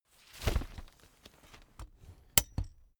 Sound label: umbrella big open full soft